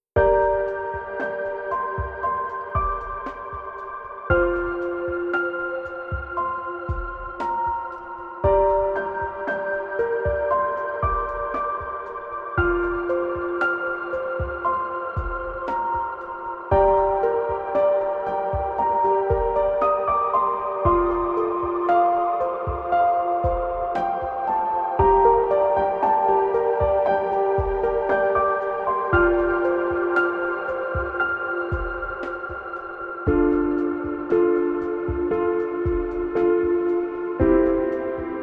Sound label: Gloomy Day Two, drama sad reflective